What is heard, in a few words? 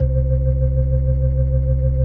C1F, B3, Vintage Keyboards